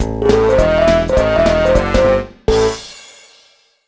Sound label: Jungle Hijinks Lose; MVDK2